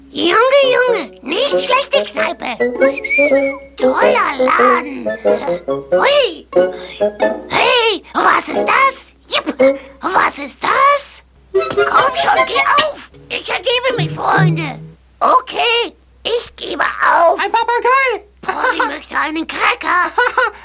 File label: speak parrot